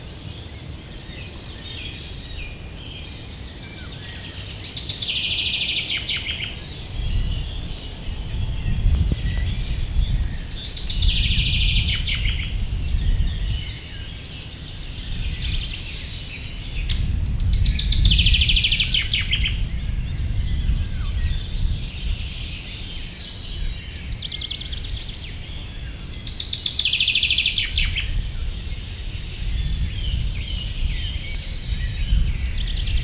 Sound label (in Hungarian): madar, dravasziget; lakatlan sziget; cardioid